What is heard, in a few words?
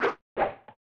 Tile Match (Swing); Shanghai 2 Dragon's Eye; snes